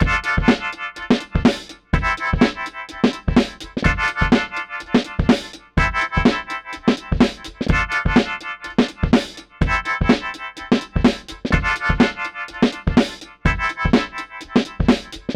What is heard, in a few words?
Used Speaker Wet; filters bundle